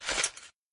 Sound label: heavy armor